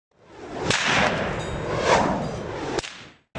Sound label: Whip